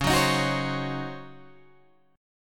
Db Major 7th Suspended 2nd Suspended 4th, Db, Guitar, Open G